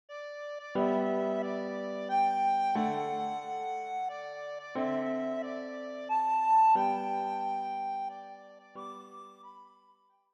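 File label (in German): Happy birthday to you, Sopranblockflöte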